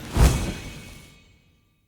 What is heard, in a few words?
Weapon Upgrade Word Select